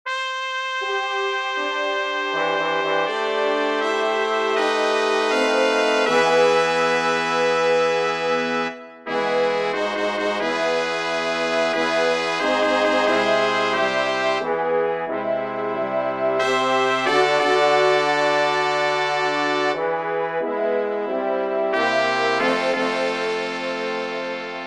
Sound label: Fanfare